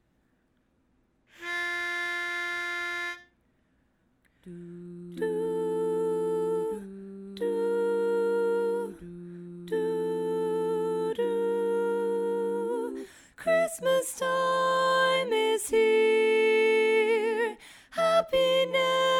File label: Tenor Dominant